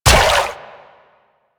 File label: Step Water; Warframe; Infested; Zealoid Prelate